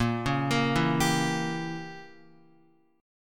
Bb Diminished 7th Bb Guitar; arpeggiated; JGuitar

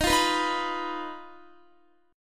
Eb Suspended 2nd Sharp 5th, Guitar; Ebsus2#5 strummed; JGuitar

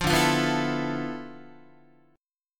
D Minor 6th Add 9th down Guitar; Dm6add9 strummed; JGuitar

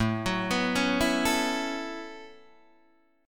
A 6th Add 9th A down Guitar Open D

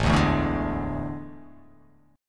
A Major 7th Suspended 4th

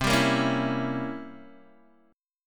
C 7th Flat 9th C down Guitar Open D

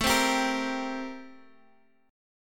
A-Minor 7th Flat 9th, chord; A-7b9 Chord {X X 7 5 8 6} strummed

